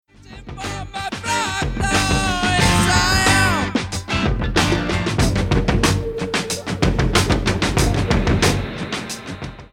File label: Beat; Titan